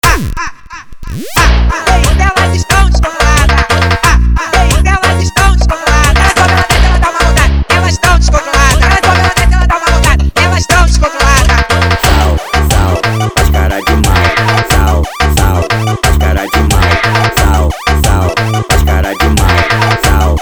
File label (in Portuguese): loop funk das antigas